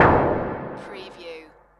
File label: Space Scifi Sound - white blast